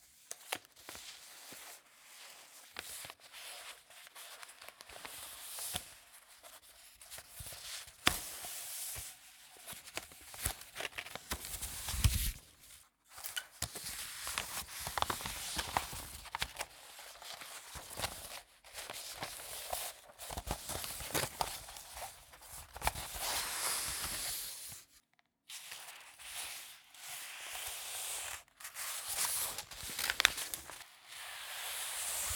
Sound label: turn paper big, Page Turn